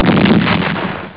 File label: explode